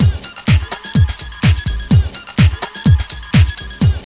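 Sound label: drum loop; X-Static Goldmine 3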